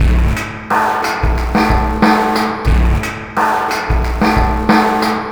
R&B Drums; Sonic Foundry (Sony Creative Software); Live n Ruff

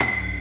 fire pole; Spectrasonics; Metamorphosis

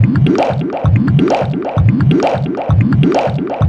NRG SFX, Trance

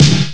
sp12 snare; ST-XX Amiga Soundtracker Sample Packs

